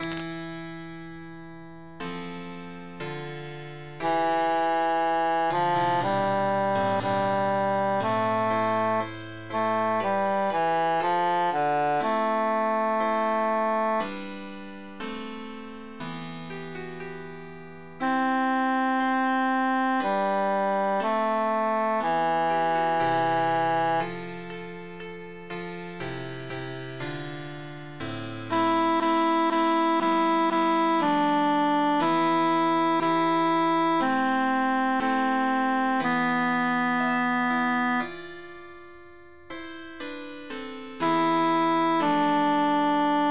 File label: tenor emphasized; mille regretz